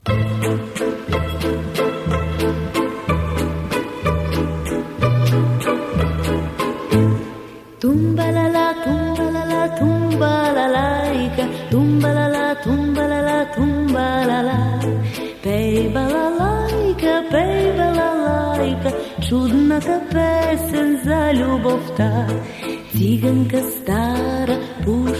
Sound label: BALALAIKA, BULGARIAN